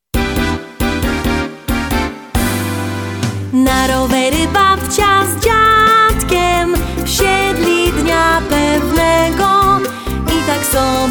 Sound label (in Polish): rumba na rowerze